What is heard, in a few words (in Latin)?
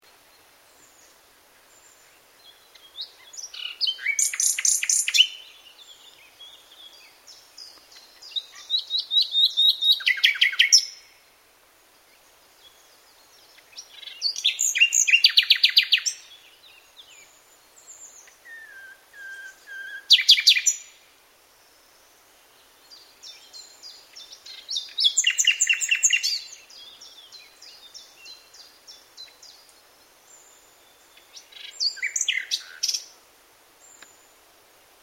Luscinia megarhynchos; SETE